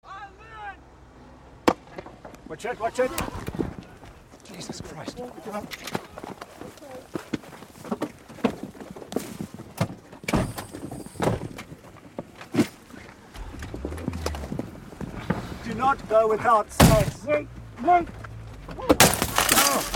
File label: turkish police tear gas